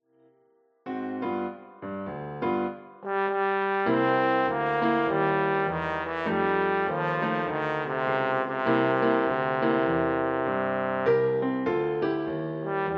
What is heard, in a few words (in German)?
Posaune, Klavierbegleitung